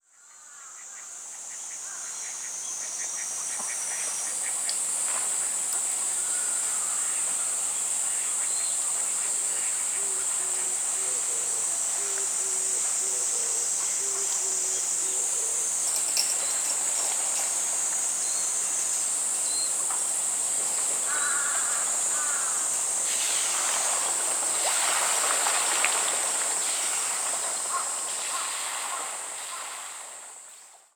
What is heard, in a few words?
bird